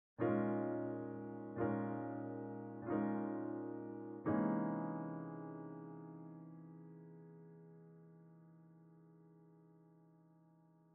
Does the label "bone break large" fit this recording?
No